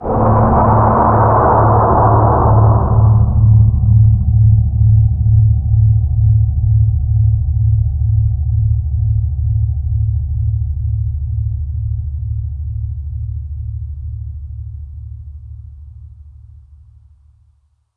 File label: powerup